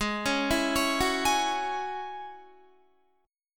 G sharp 7th Suspended 4th Sharp 5th, Guitar; arpeggiated; chord

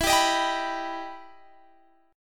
E Minor Major 7th E down Guitar Standard; EmM7 strummed

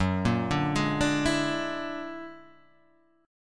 Gb Augmented 9th Gb down; Gb+9 arpeggiated; Standard

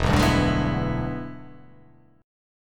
E 7th Sharp 9th, chord

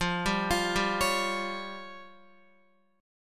F Suspended 2nd Sharp 5th F down Guitar; Fsus2#5 arpeggiated; JGuitar

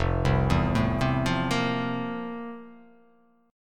F Major 11th, chord; arpeggiated; JGuitar

